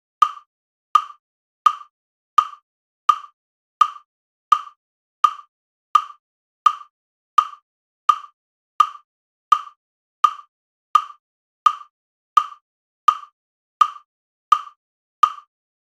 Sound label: metronome Ab major 84bpm